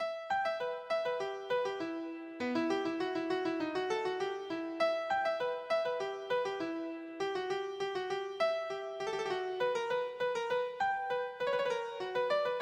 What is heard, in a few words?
bach badinerie keyboard